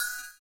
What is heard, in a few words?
CYM, Ethno Techno; ILIO - Ethno Techno